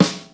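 snare power, Drum Machines, Yamaha Motif ES-Series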